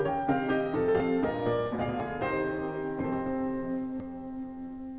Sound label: piano